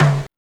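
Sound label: snare drum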